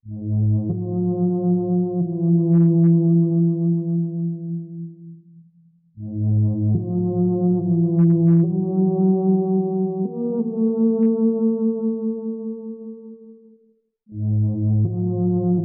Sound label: fake cello scares; Loops; Electronic